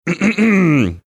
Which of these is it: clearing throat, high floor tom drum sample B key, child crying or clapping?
clearing throat